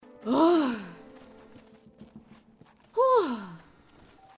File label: moan